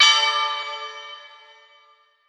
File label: bell fm (D); Sonic Foundry (Sony Creative Software); Ugly Remnants VOL-2